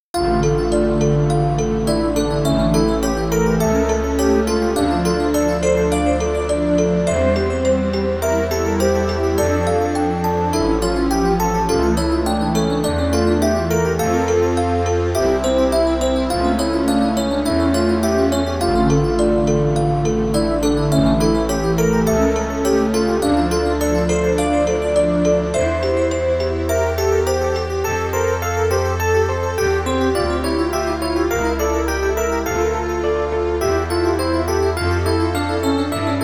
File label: Baroque Music; fatal relations; PC-98, Windows